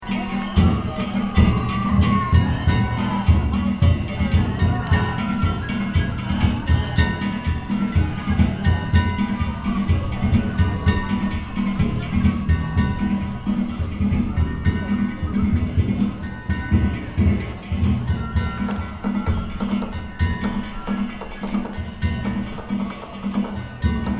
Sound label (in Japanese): お祭りの音